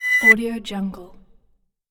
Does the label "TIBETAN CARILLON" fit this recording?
No